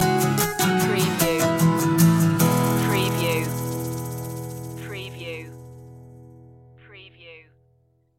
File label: marimba surfer stinger